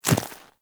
Small Walk Dirt, Footsteps, Infested; Enemies; Warframe

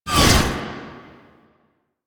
Whip Swipe; Weapons; Melee; Warframe; Dagath

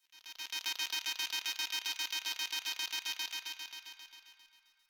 Computer Room Ambient Beeping

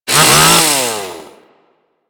Swipe; Warframe; Grineer Ghouls; Ghoul Sawman; Attacks

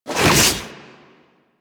Inf Transform Claws Whoosh, Infested; Warframe; Weapons

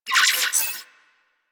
Digital Glitch; Ambience

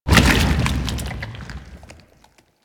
Purification Machine Buried Spawn; Warframe; Nineteeen Ninety Nine; Infested Survival

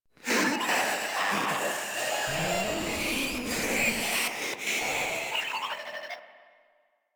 Proto Infested idle; Warframe; InfestedNinetyNine

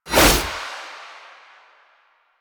Skana Whoosh; Melee; 1999

